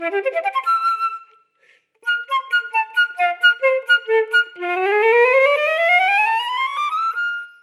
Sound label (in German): Floete